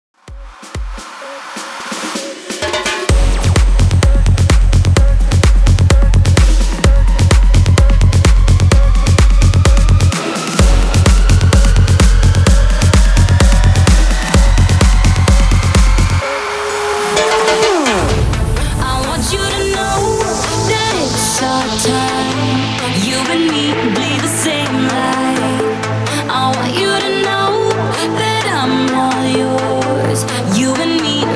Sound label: 128 BPM